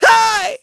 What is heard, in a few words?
fall; Pulse Racer